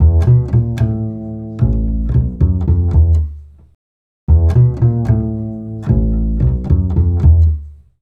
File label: Acoustic Bass; Sonic Foundry (Sony Creative Software); Leo Cavallo Bass Taster